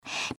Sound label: breath; pony; Twilight Sparkle